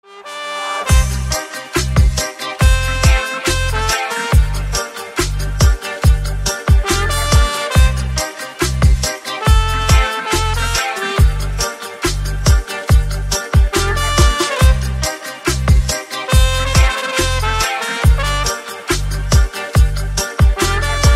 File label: instrumental, reggi